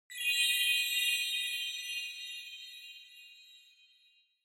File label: Onoma Sparkle Low Short